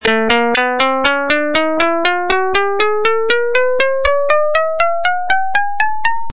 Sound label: scale